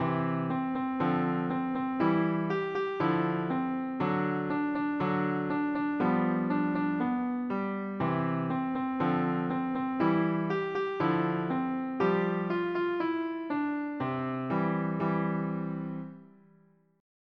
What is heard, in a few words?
piano; normal tempo; PlayScore